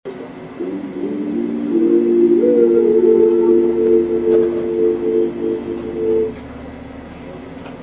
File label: harmonizing